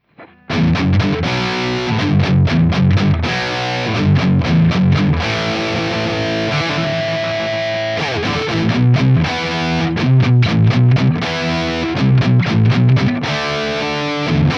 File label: gtr herta sm57